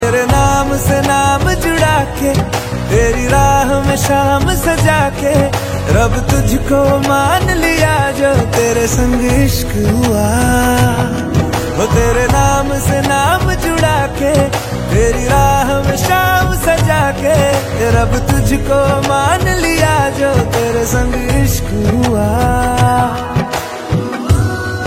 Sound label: ringtone